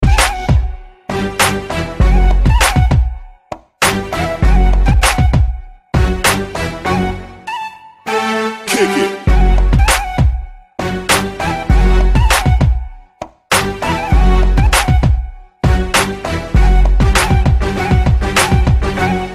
Arabic Ringtone

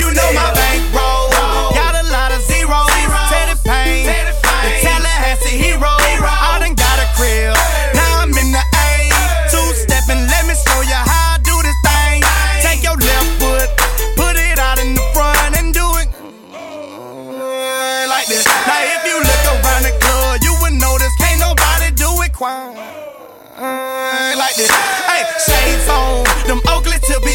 2 Step Remix

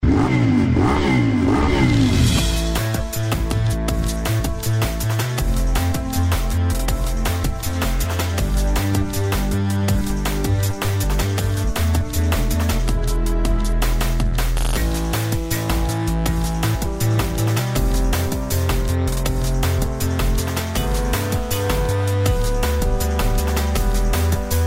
Ducati Monster

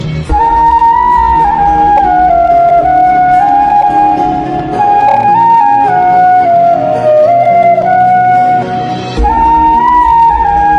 Romantic Fluit

